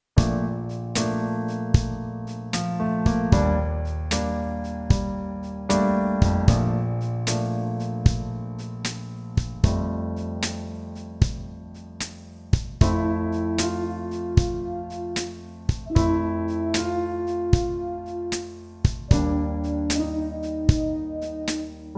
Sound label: Lento, brass; backing tracks